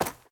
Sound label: snow run, step; Minecraft; Remastered